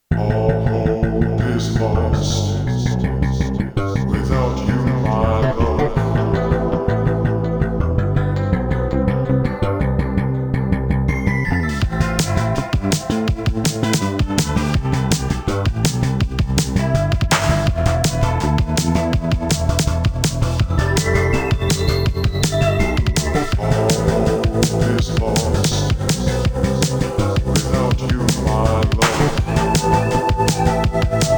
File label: LoFi 12XT Pattern